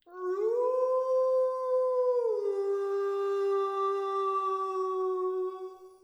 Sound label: mon wolf, monster